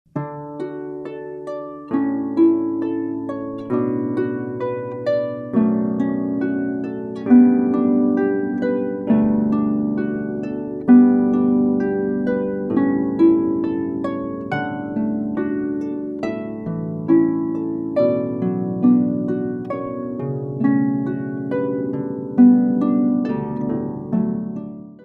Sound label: Canon in D